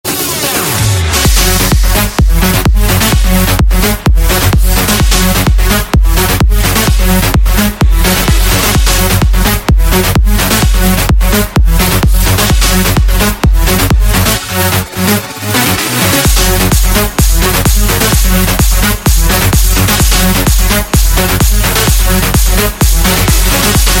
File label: edm